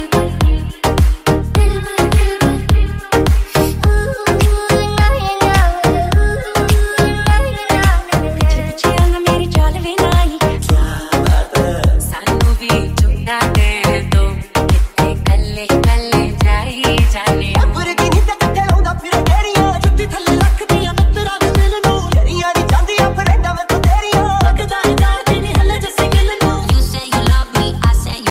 english punjabi remix ringtone

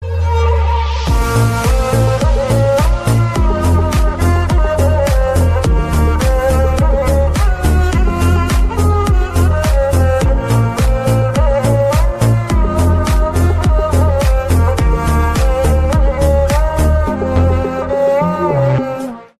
huawei ringtone; reklam 2

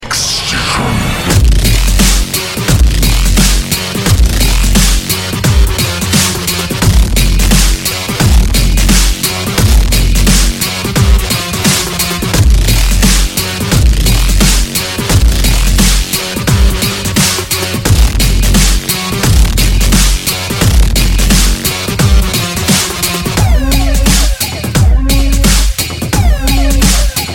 dubstep music, ringtone

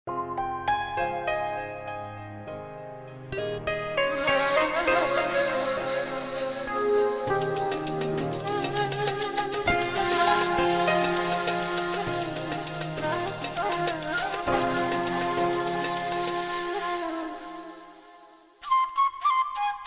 piano mix ringtone

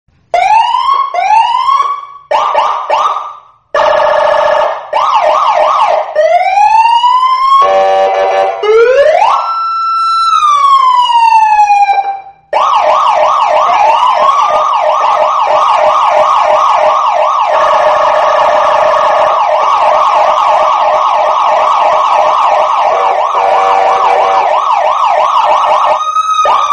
bus siren loud alarm tone; galaxy ringtones

